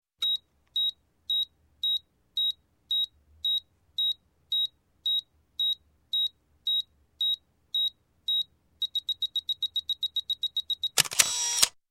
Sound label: Camera 35mm Automatic Self Timer, Beeps, Shoots; Sound Ideas 2000 Series